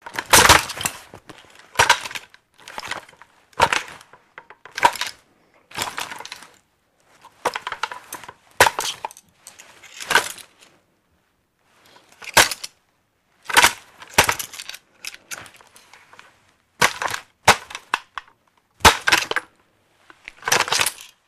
Gun Handling Heavy, handgun mvmt

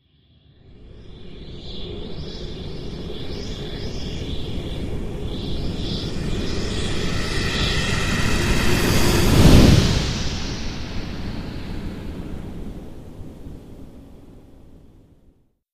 Ventilator Sucks Ai, air

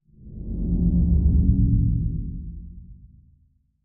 Space Ship Cloak On; spaceship fx

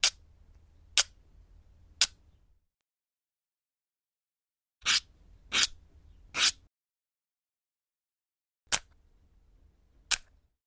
Box Matches Scratch; lighters & matches

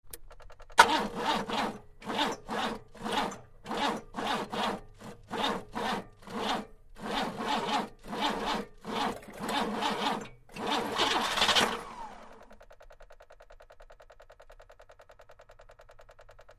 Car; False Start, Never Turns Over; Car 2

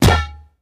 Mortar Blast, explosions

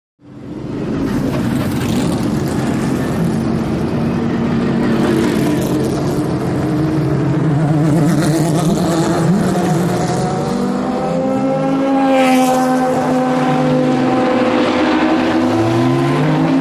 Sports Cars Away CU

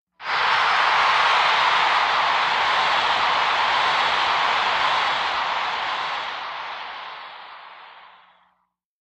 Concert Crowd Huge Cr, concert

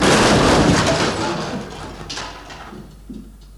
Crash Med Multi Drop, car crashes

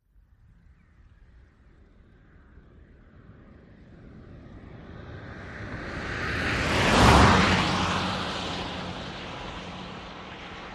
VEHICLES ASTON MONTEGO: EXT: Medium pass.

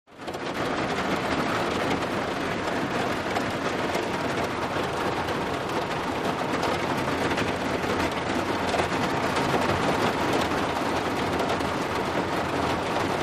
Rain Heavy To Light Ra, raindrops, splatty

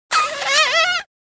Lizard Water Monitor

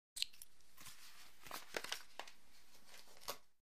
Biking Unsnap Helmt

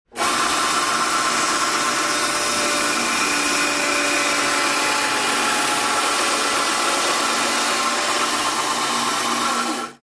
Industrial Saw, Large; Cutting Wood.

